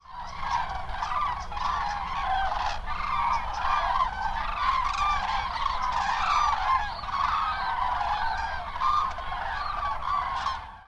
kraniche

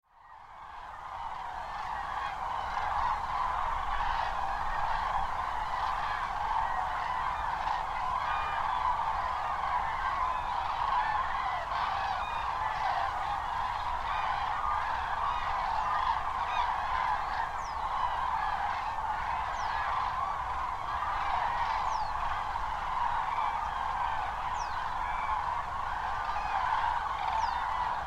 kraniche, vogelstimmen

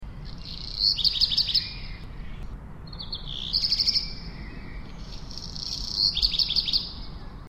hausrotschwanz, vogelstimmen

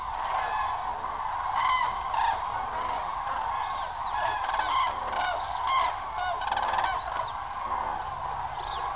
kranich

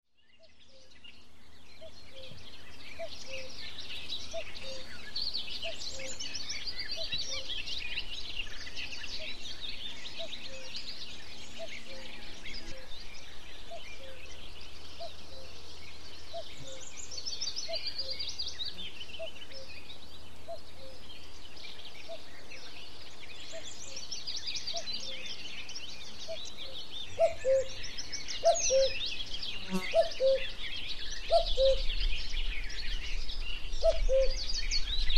wiese

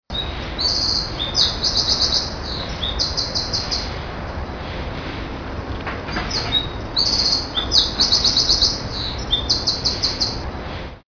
unbekannte vogelstimme

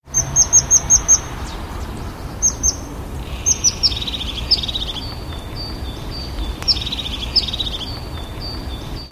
haubenmeise

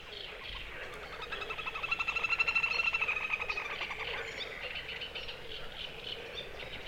zwergtaucher

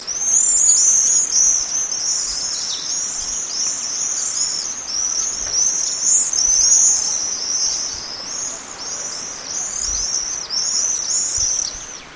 mauersegler, vogelstimmen; Apus apus

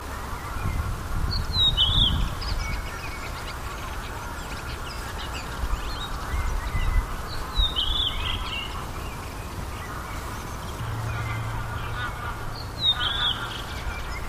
rotdrossel; Turdus iliacus

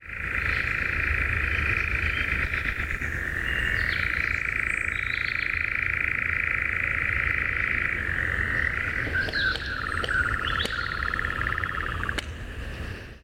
ziegenmelker

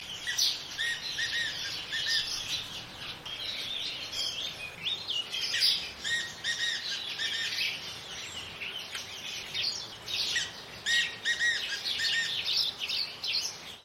halsbandfrankolin, vogelstimmen

